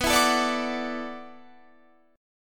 E 7th Suspended 2nd Suspended 4th, B

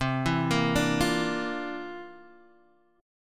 C 11th C down Guitar Half Step Down

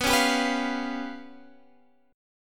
Db 7th Flat 5th

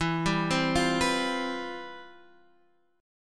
C Major 11th E

